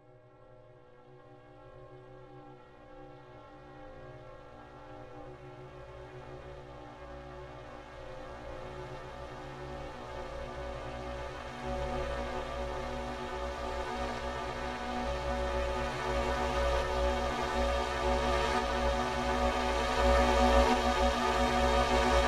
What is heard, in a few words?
Panic or Scarey Build Up music